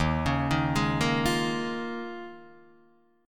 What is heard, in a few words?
D sharp 9th Suspended 4th D sharp, Guitar Open D